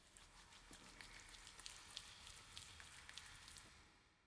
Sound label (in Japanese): 温泉 (ボディソープ泡だて)